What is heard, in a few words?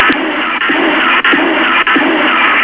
steps